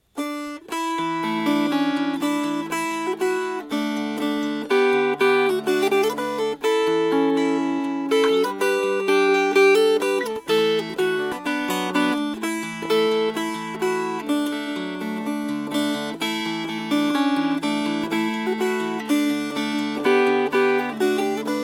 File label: Our Ship She Lies in Harbour Fingerpicked